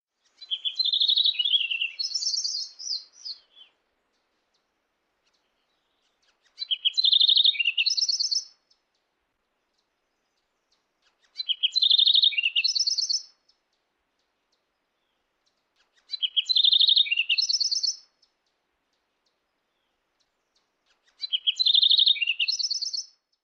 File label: House Wren